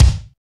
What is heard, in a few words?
zoom kick